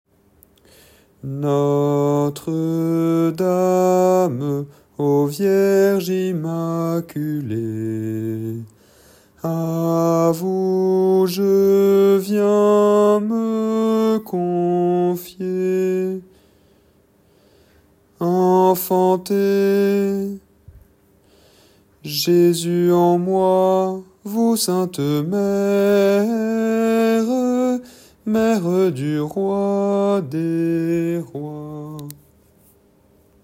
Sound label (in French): Chant du Jubile Refrain Basse; sanctuaire